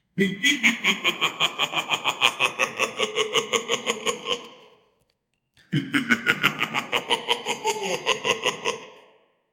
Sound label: thief man stealing, evil